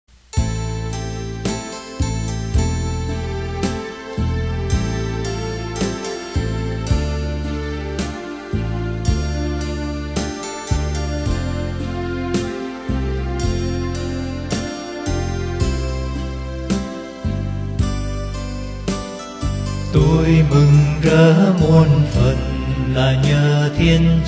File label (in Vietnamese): BASS